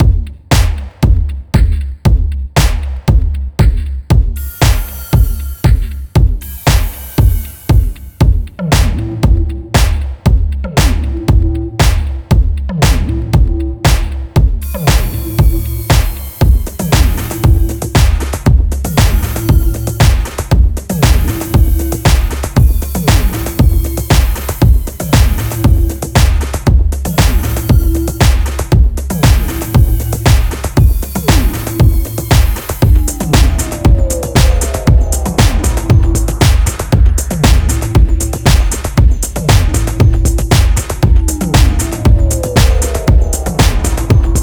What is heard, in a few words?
mus disco combat; Foreclosed